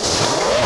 ROBOTER M; Best Service; Advanced Mega Effects

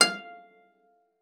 LAUTE F5; Xsample VOL-8; LAUTE+FLG

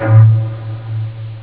hallpfeife; ST-XX Amiga Soundtracker Sample Packs

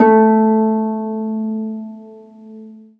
HARFE A0, Alpine Volksmusik; Best Service

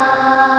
Chor; ST-XX Amiga Soundtracker Sample Packs

